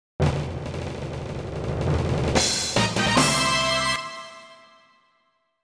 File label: Bomb Fanfare; Aero Fighters Sonic Wings; Angela